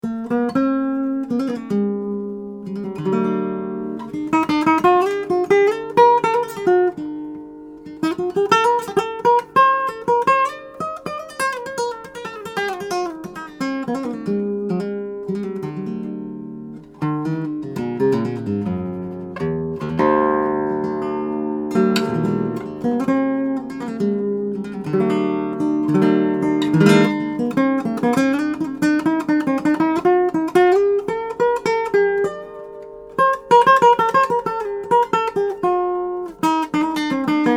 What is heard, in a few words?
Improv Flamenco